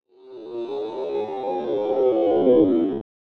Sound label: Vox; The Weird Side Samples; 99Sounds